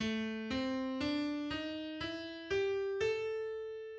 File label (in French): Gamme blues la mineur